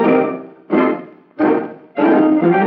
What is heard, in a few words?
horns, Phonky loops